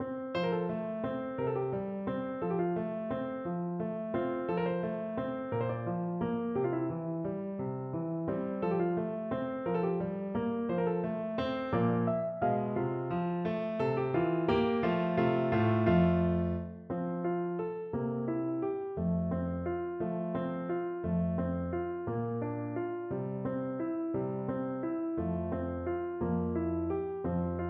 paisiello el cor piu, French Horn

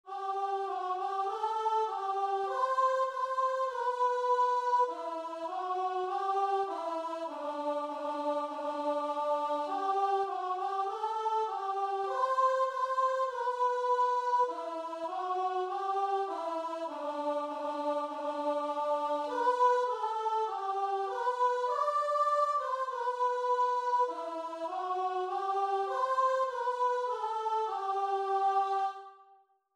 dix, guitar and vocal

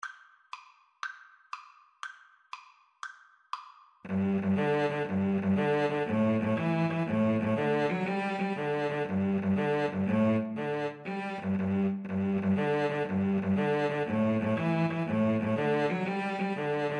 irish jig medley violin cello duet

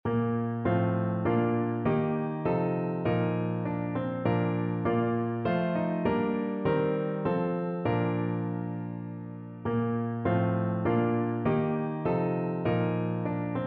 all glory laud PNO, piano

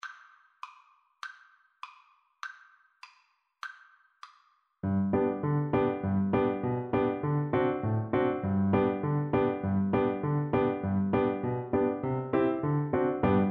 pincushion polka, Viola

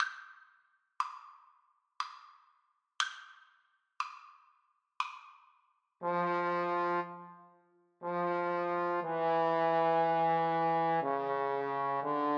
adagio duet, Trombone Duet

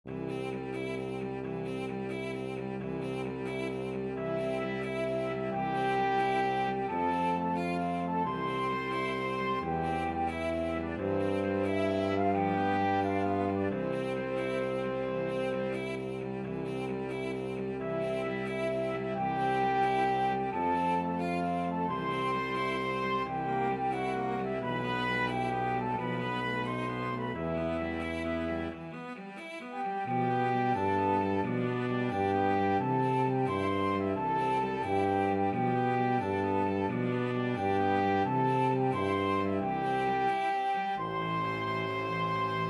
o holy night, flexible mixed ensemble 3 players

